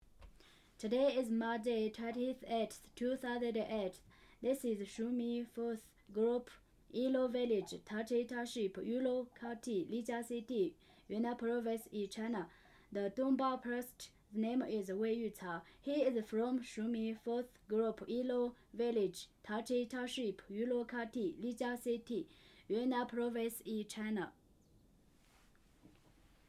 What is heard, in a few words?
Yulong Chanting